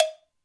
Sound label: Md Cow, Alesis D4 drum machine